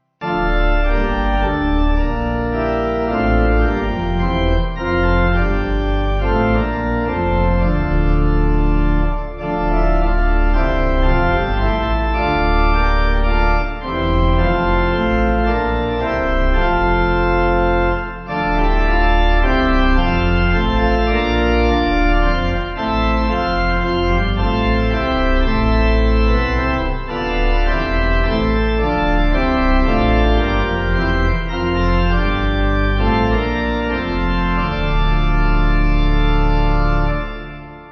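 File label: Kings Lynn Pipe, Pipe Organ; By All Your Saints; King's Lynn 7.6.7.6.D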